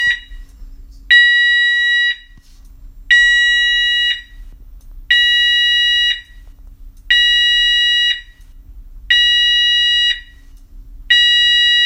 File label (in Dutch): CO Alarm kantoren